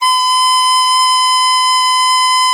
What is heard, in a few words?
DIZI B4, Winds; E-MU Producer Series Vol. 7 – Old World Instruments